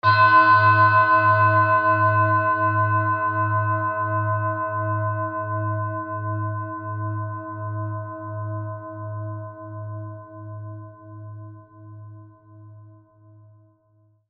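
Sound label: tibetan bowl; neptunset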